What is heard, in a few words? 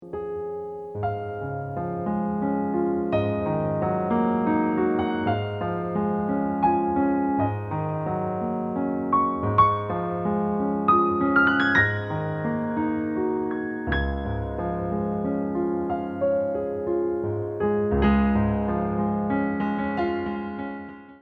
Adage